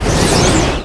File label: die; B YORTUS